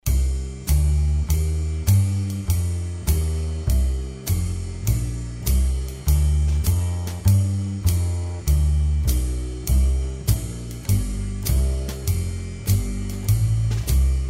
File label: bsdr